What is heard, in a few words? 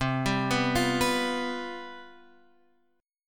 C Major 7th Suspended 4th, down, Guitar, Open F; CM7sus4 arpeggiated